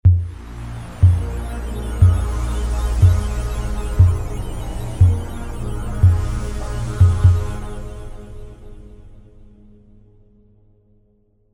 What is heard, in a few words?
Midi